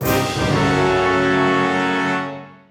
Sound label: Cinematic; Ghostbusters; Sologhost